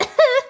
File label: coughing; sm64coopdx; char-select-extra-chars; daisy; [CS] Extra Characters